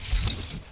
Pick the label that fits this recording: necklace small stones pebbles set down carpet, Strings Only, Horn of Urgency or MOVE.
MOVE